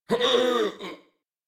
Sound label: Death, en; Warframe; New War; Teshin